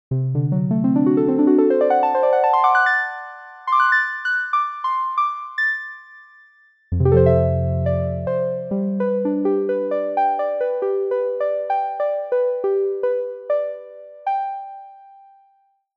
Harp, Jupiter8; OPXPROII; opxpro2

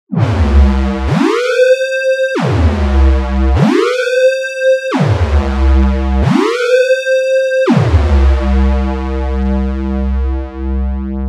detuned portamento; obx; vst audio; opxvst

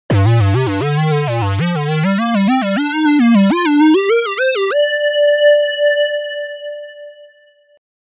pulse solo

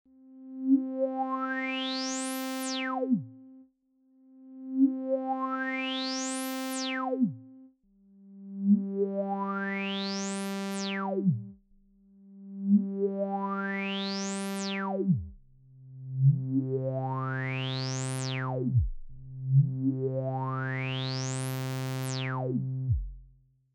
filtersweep reaktor

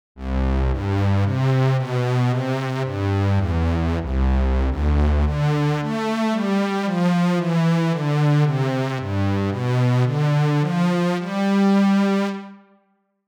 Cello, Jupiter8; OPXPROII; opxpro2

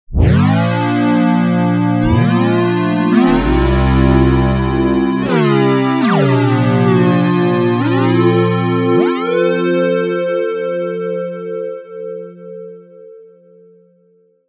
slow portamento; obx; vst audio; opxvst

